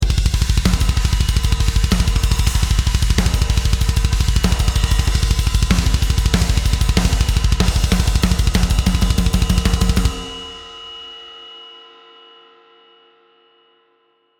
Technical Metal Groove 8 - Jumpstart (Processed), drums